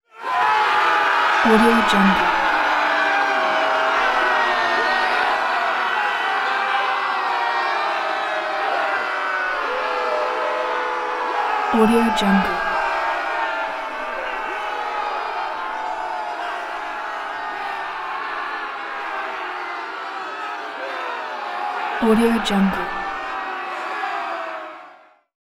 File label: battle cry